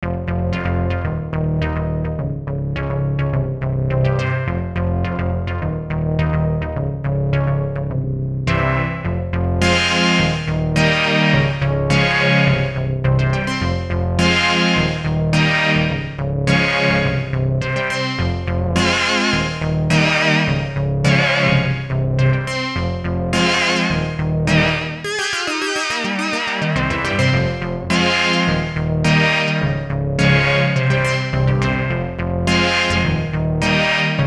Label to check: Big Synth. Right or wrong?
Right